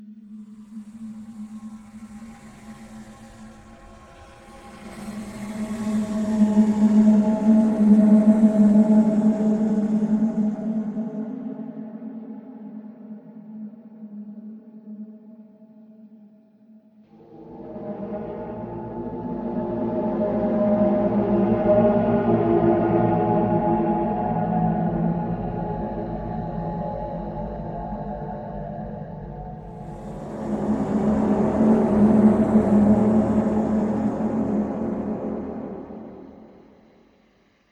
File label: Spooky Horn